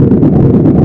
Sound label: thwomp shaking